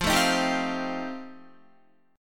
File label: F 9th F Guitar, chord; strummed